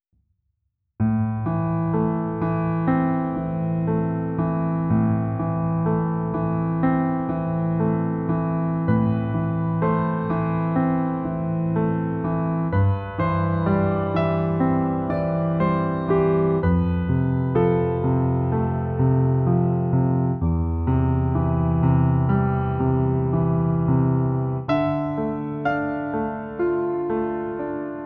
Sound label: pure piano version